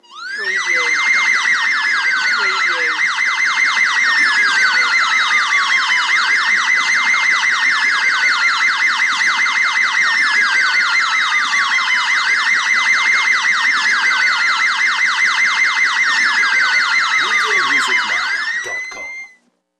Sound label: Ambulance Siren Multi Layer